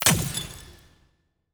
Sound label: block destruction glass